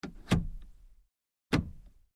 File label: Truck GMC Savana Switch Door Lock; 2010 GMC Savana; Trucks (Sound Effects:Vehicles); Big Room Sound